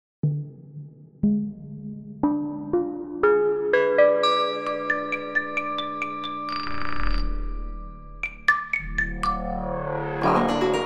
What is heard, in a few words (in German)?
Steinmusik; reise